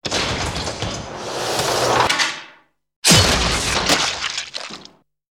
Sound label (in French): Guillotine tranche